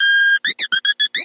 Droid; Self Regulating

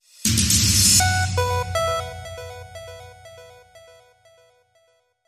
Early Signals Logo Only

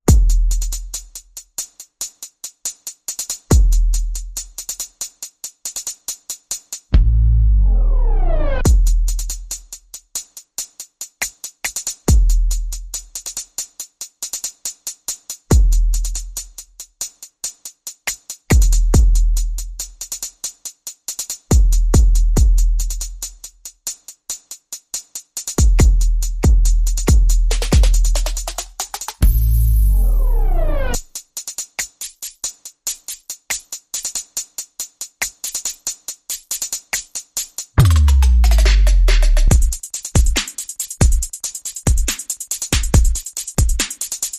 Perc and FX

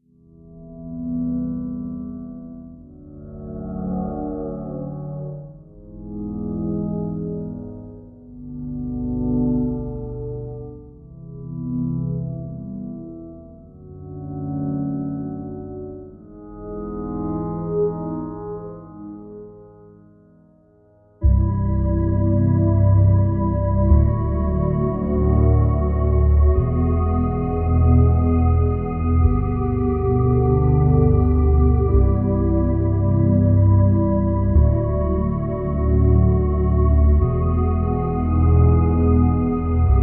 Ambient

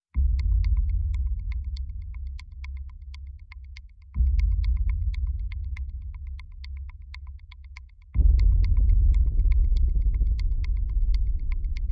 Percussion and FX

